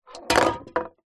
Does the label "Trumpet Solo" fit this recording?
No